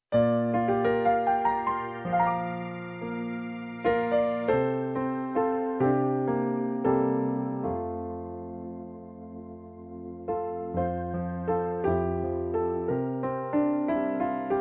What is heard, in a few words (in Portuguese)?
playback hino